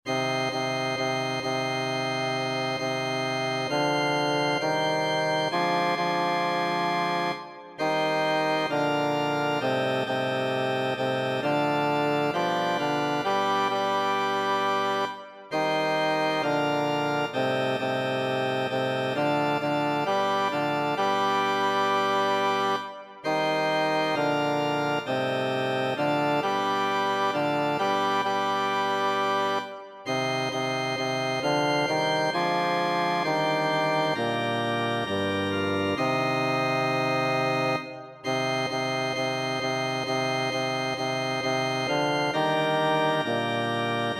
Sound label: pater noster bass; stravinsky igor